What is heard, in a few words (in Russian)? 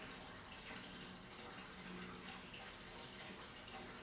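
я шел по улице и услышал шум канализации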